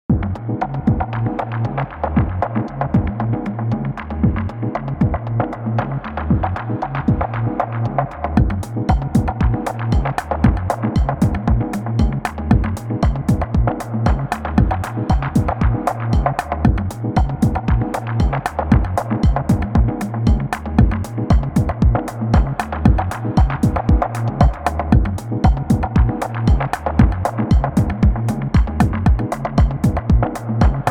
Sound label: breakbeat variations; chopper